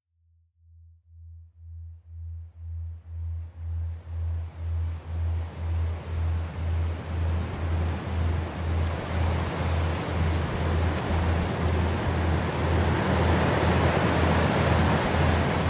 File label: Pure Meditation Low